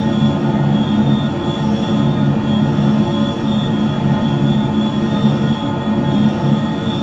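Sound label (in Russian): жуткий зацикленный окружающий шум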